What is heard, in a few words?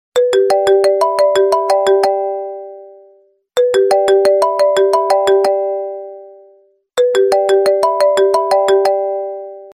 iphone 4 ringtone, apple ringtones